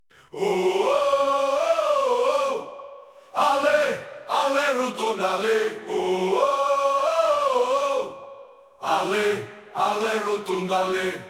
claque

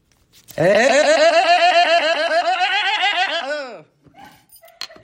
mouton du bled

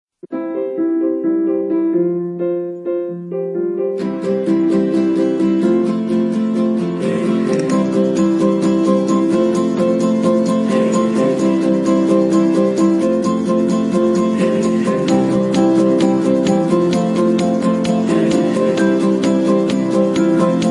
musique; free mobile

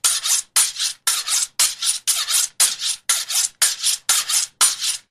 aiguiser couteau

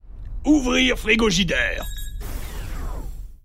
ouvrir frigogidere

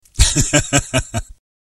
rire

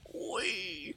cochon triste

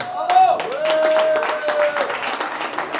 applaudissement concert bar

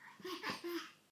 chien

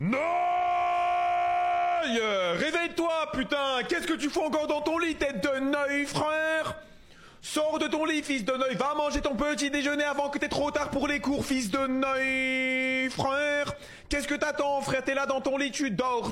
reveil

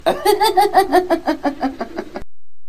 rire real; francois damiens

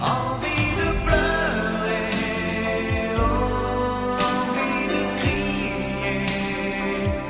envie de pleurer

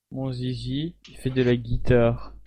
guitare

